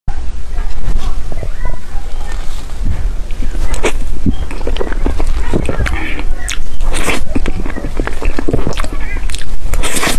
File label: Eating cream filled cake